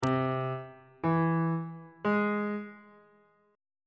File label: c augmented triad chord on bass clef